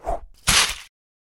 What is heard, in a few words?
Snow Ball Throw And Splat